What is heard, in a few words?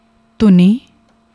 dhuni, singing style; Vaaran; musical tunes; Sri Guru Granth Sahib